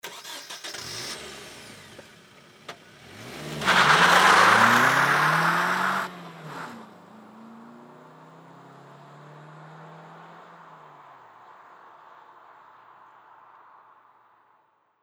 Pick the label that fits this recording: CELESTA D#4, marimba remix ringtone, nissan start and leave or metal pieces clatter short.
nissan start and leave